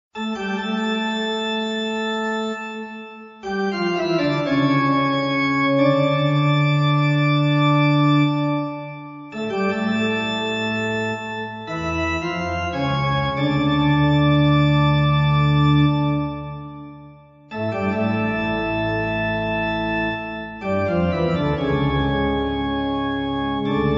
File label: Toccata